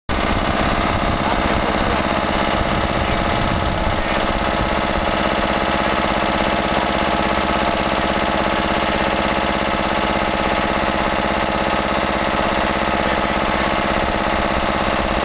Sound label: Tractor Komatsu, heavy cars